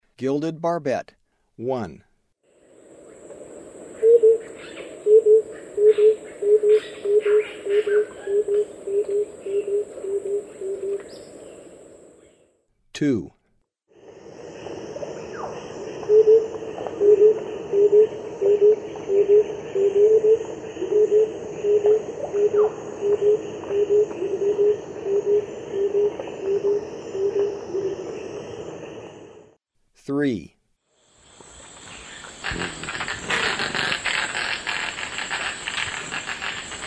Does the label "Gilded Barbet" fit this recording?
Yes